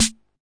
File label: Roland RHY55, DRUM MACHINES